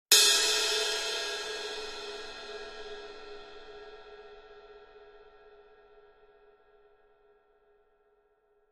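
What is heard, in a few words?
Cymbal Medium Single Hit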